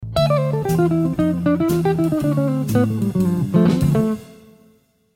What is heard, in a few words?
Melodic Line